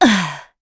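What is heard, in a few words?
Vox Landing, English; kingsraid; voices heroes en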